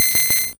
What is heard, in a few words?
GB Beep; SFX; SID & GB; ChipShop Deluxe; ChipShop Hits